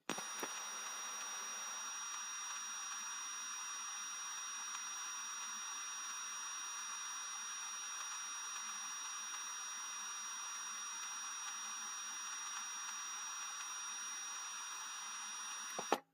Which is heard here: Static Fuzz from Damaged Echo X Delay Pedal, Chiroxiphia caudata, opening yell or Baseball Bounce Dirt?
Static Fuzz from Damaged Echo X Delay Pedal